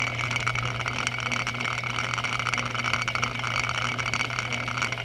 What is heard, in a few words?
grinder; Mirtahm